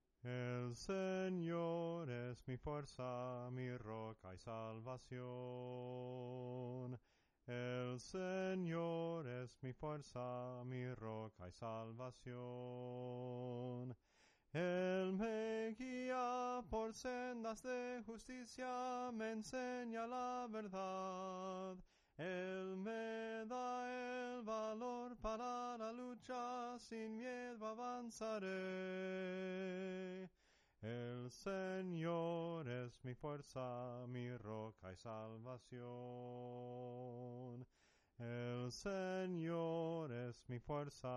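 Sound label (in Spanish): SOPRANO CANTADO, himnos en melodia soprano; EL SENOR ES MI FUERZA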